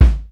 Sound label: KICK, Hip Hop